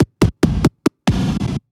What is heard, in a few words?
Scratch Fills 140BPM